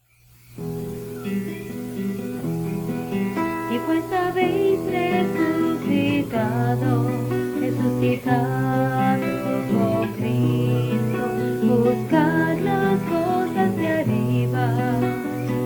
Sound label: spanish; If You Are Risen Up With Christ